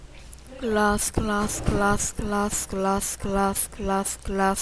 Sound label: glass